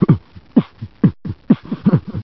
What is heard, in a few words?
Hip Hop